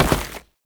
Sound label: Step Ice, Foley; Warframe; Oraxia